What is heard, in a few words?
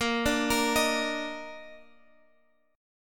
Bb Major Add 11th, Bb